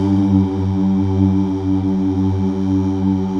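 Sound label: Male Uuh; Best Service Gigapack 1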